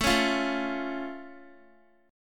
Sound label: A Augmented A down Guitar; A+ strummed